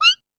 Dance, Drum Machines

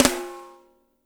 PIC SNR, DRY KIT; Spectrasonics; Burning Grooves CD2; DRY KIT 4

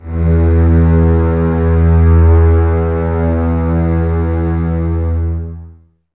BSS E 2, Basses, String Ensembles; Miroslav Vitous; 90 s Sample CDs

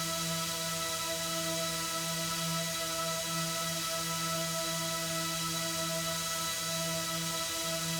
Drone; stereo toolkit samples; Non Tempo Loops

